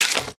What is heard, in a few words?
agricultural tower harvesting, factorio sound entity